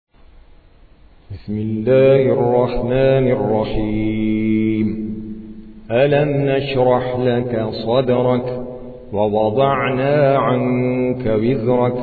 ترتیل قاری هندی; سوره انشراح

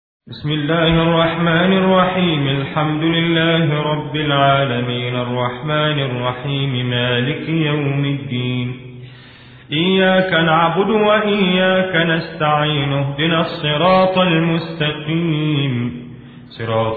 ترتیل قاری مصری; سوره حمد

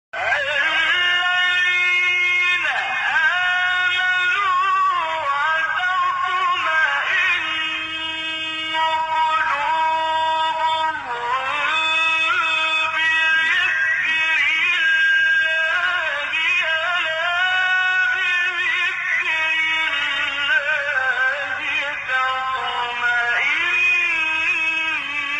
تلاوت زیبای آیه سوره رعد; آیه 28; استاد طنطاوی

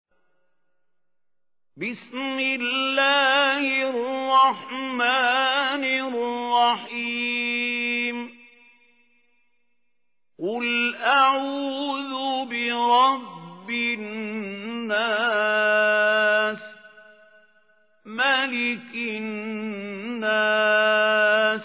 تحقیق سوره ناس; استاد خلیل الحصری

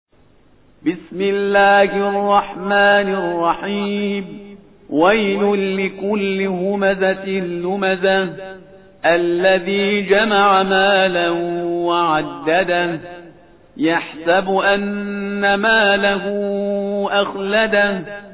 ترتیل سوره مبارکه همزه; شهریار پرهیزگار

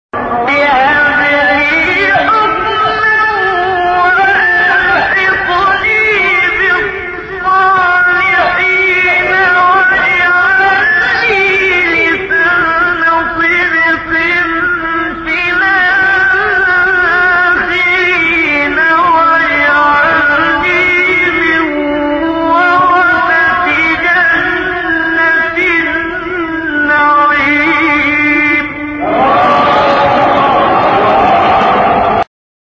دعای زیبای قرآنی; محمد صدیق منشاوی

